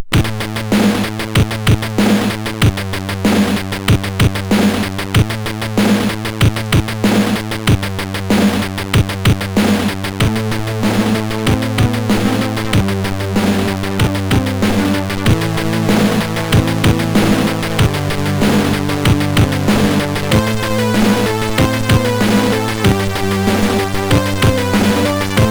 Digi, MOS6581R2; New Digi 8

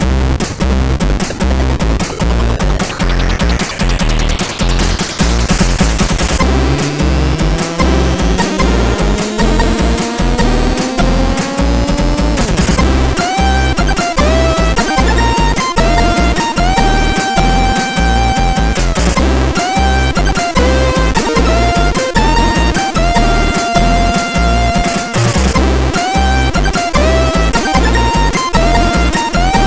c64 scene sid CSG8580R5

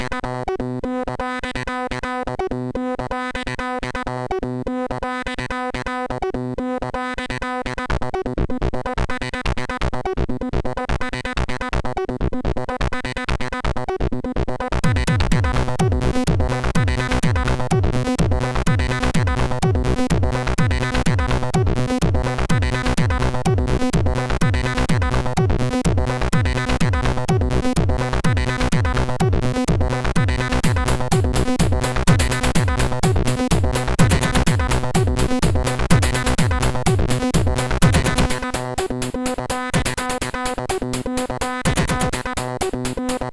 Compo Music, sid MOS6581R4; Dooshek

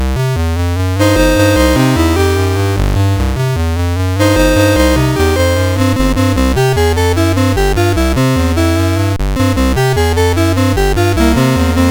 Bugle Boy, CSG8580R5

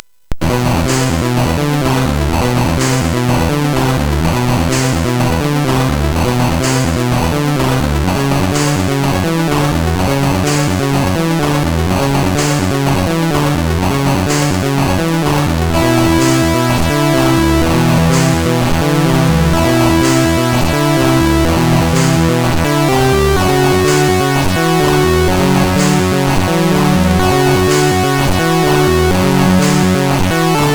sid MOS6581R4, GAMES; Dalek Attack

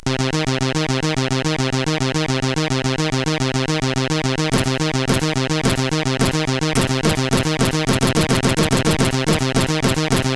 Fast Death, CSG8580R5

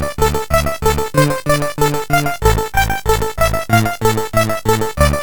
Intro, sid CSG8580R5; Radio Pack 08

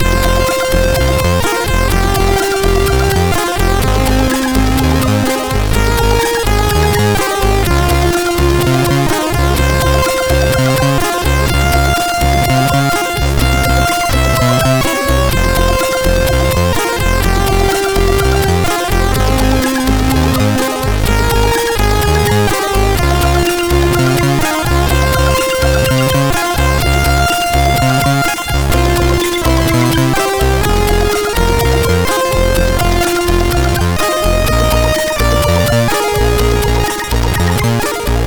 Cosine Theme, sid MOS6581R4

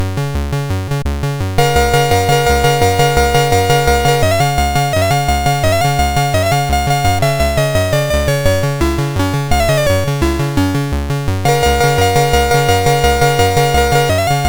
Sabre Dance, MOS6581R4

